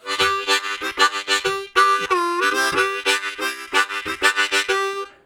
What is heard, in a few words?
Chug, Harmonica Riffs; Zero G